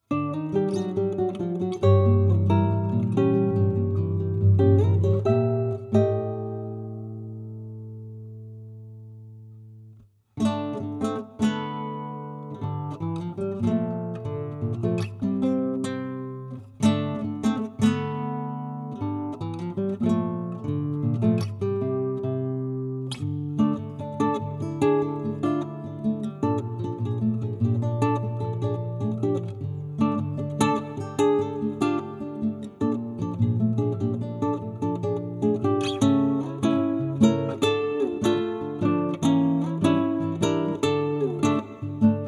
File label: (Acoustic); Side Quests Vol. 2; Castlevania